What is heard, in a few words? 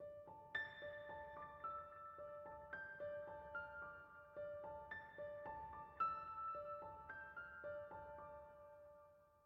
Piano; Frozen Flame; windows